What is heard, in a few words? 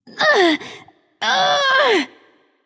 grunt lift light; smite; voicepack; hel